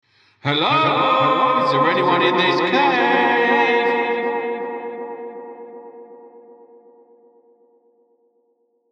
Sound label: cave echo; The Cyclops Sensory Sound Story for SEN Learners; a sensory odyssey